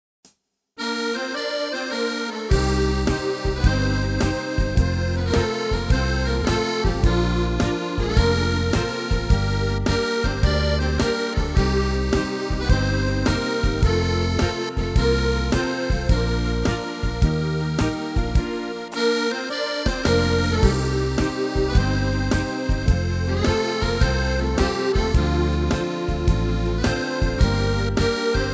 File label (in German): Schlager Shuffle mit Akkordeon Tyros4